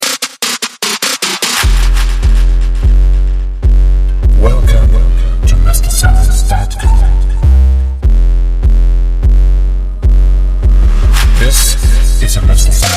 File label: Beats Dark; moestlsounds